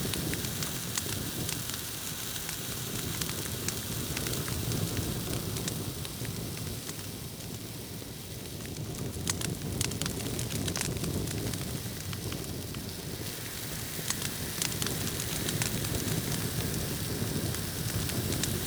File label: Status Effect Fire Loop; Status Effects